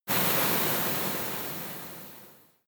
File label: volcano oneshot lava hiss